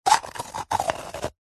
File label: coconut shell 2x halfs empty scrape turn hollow; zapsplat